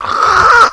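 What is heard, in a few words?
die, alien controller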